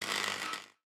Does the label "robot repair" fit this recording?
Yes